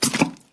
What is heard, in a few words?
splash; openspace3d; vrcells